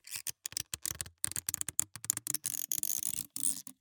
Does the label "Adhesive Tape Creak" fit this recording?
Yes